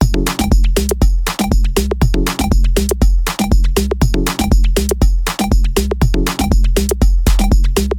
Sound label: Music; Fruit Chutes